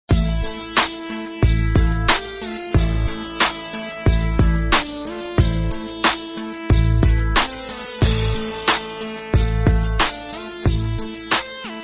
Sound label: Rap Beat